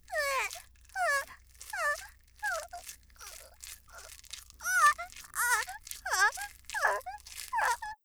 "ふえぁ"; 効果音あり

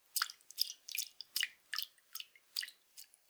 ぴゅっ, 水音効果音

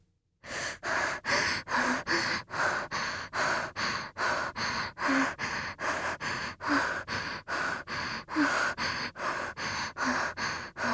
呼吸音, ボイスのみ

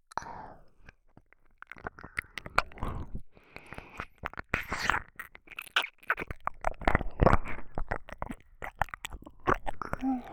耳舐めのみ, ASMR

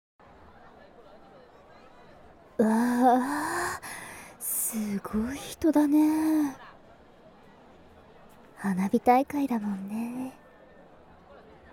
うた有; 花火を一緒に

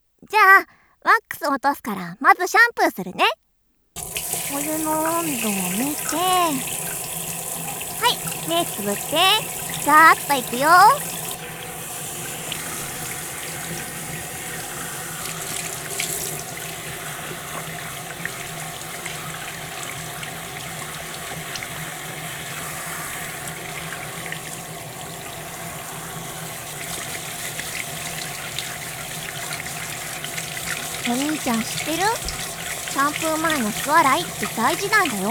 シャワー（モノラル版）

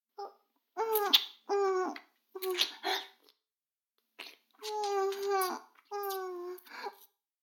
フェラ音声; 逆時間停止されて

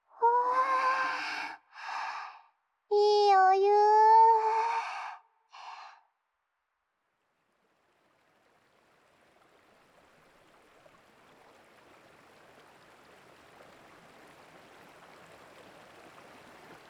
露天風呂環境音、フットマッサージャーの音, ASMR; 滝澤温泉

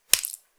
激しめ, ぱちゅ音単発

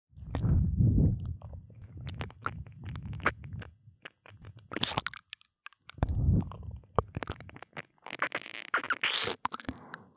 右耳キス舐め, ASMR

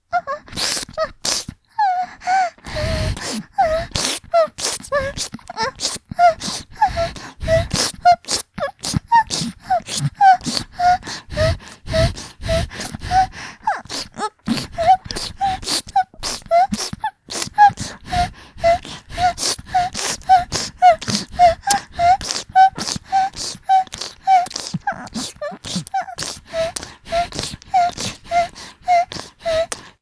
舐め屋 ピストンフェラ; ヘンルーダ（オオカミ魔女でアルケミストな花屋さん）

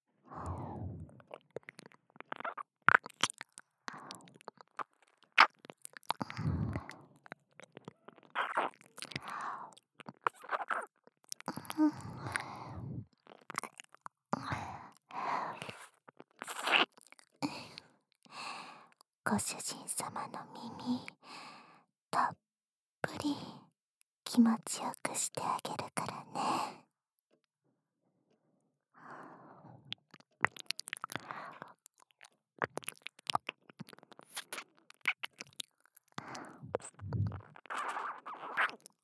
耳なめ 左; お屋敷づとめ。Vol.5―一之瀬梨蘭 リラクゼーション岩盤浴―

